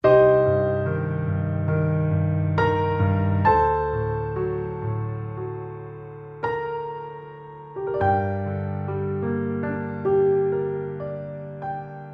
Piano Intimate

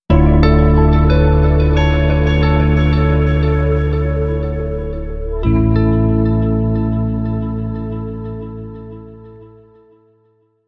Sting No Perc

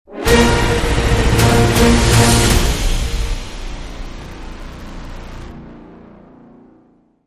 Dramatic Sting

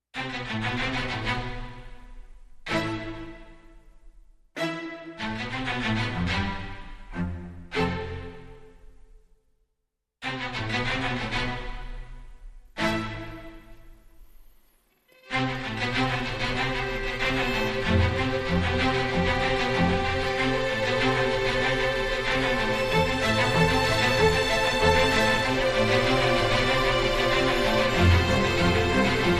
Strings

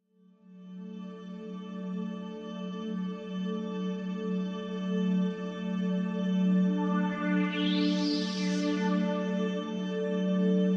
Drone, Light

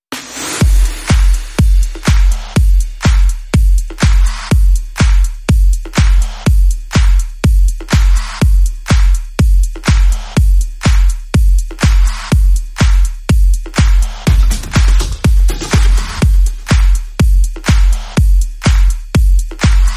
Beat

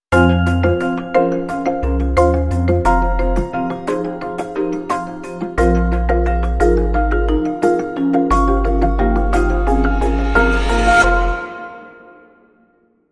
Long Sting

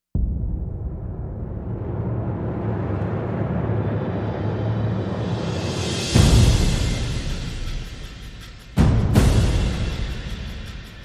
Percussion Only

